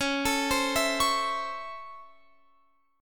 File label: Db Minor Major 7th Sharp 5th Db Guitar Open F; arpeggiated; x,20,21,19,19,20